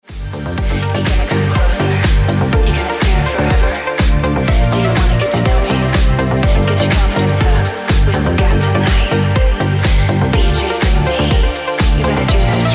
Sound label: Ringtone Deep House Music